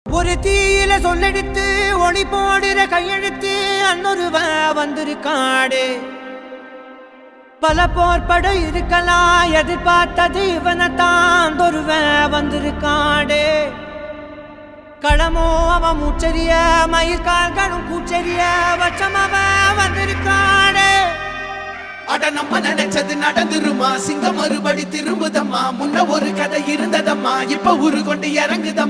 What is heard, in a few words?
Ringtone Bgm Retro